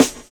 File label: ROLL; Univers Sons - Kitch 70s